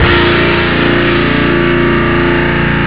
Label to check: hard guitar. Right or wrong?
Right